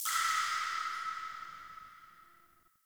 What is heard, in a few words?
Asteroid Drips; Ambience; Warframe; Grineer